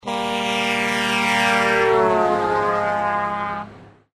wheel horn

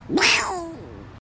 cat noise

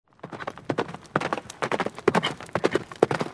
horse running

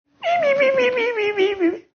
pipipi; azzaro

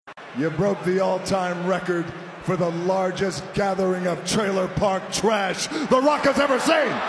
trailer bass drop

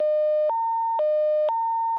panic button

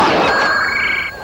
sparkle

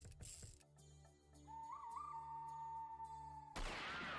click

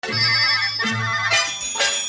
bell monster; dnd

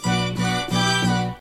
strings sample

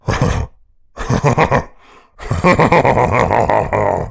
evil laughter; geometry dash

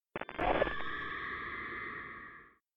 teleport

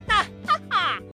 laughter

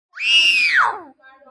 screaming

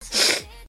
snot

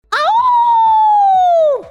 awooooo

